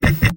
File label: sniper laugh short; vo; tf2; mvm